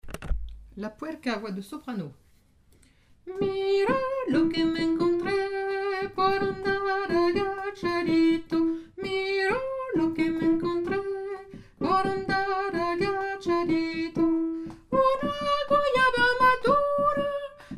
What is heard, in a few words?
soprano